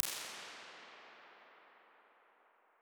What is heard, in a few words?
Far Away, Convolution, Large Rooms, IR Samples